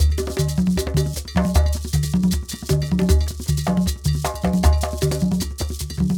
Combination, World Percussion, Xylo, Drones, Shakers, Talking Drums, Stick Drum